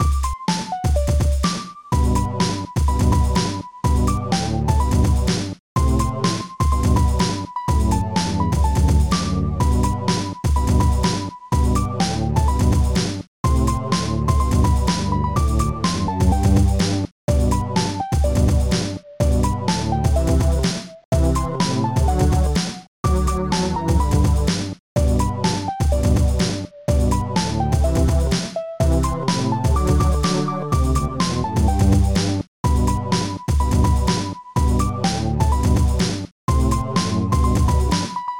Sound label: jingle